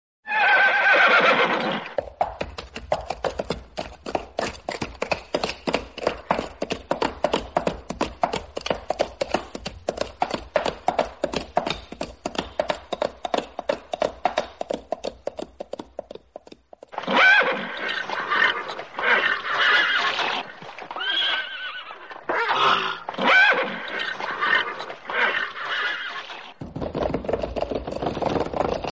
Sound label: horse sound musical tone ringtone